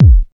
original kick drum sound a key, bass drum single shot tuned to the A note